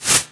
bullet L to R, nearmiss; hl2

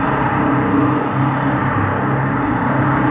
tunnel tone, city; hl2

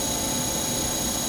turret loop, turret wall; hl2

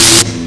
spark, electric; hl2

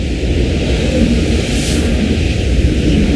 air suck, incinerator; hl2; citadel

